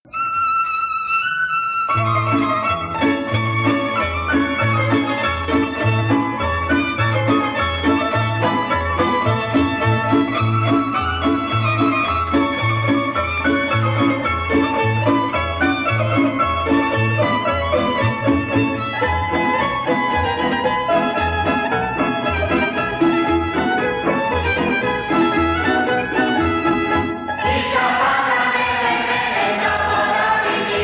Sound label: Croatia Kisa Pada, Folk Dances; Petaluma; 1st String Folk Dances; Croatian